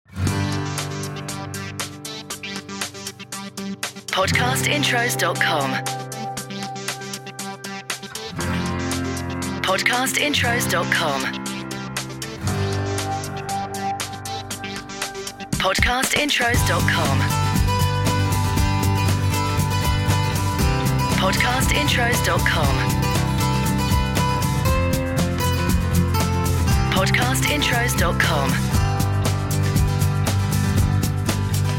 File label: MOTIVATIONAL; Podcast Watermark